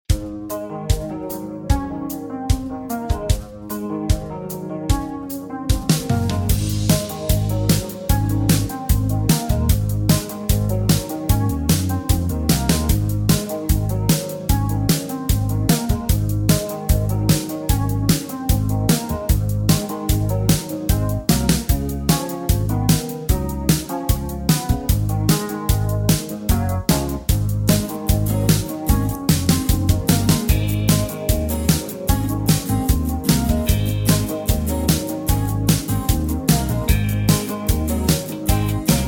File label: Ab No Backing Vocals